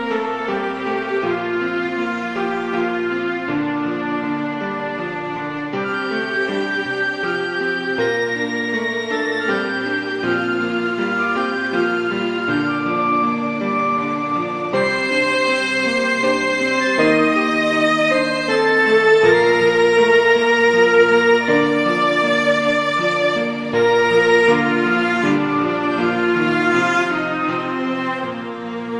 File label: instrumental silent